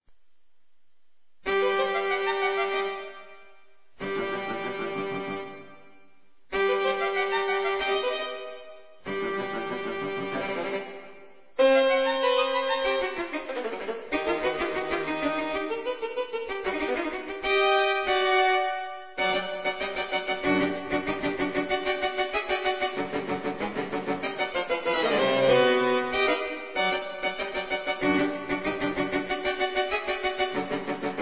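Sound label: bihexany violin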